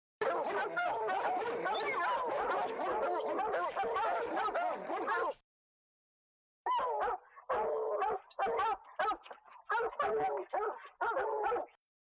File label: hound dog, car horns